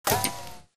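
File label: bullet light ballistic titan ricochet; Titanfall 2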